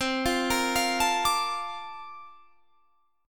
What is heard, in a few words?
Bb Major 7th, guitar; arpeggiated; chord shape